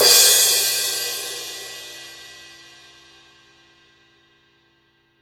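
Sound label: cymbal, Real Drum Kit, FUNK KIT; Best Service ProSamples vol.43 - Real Drum Kits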